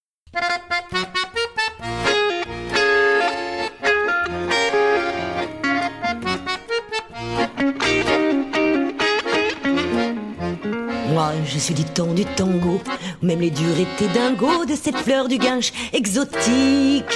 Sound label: Tango